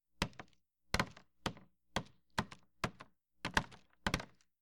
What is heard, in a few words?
Rock Drop Nuts